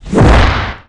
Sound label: Kick; CLANNAD